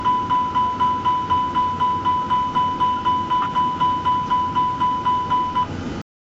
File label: alarm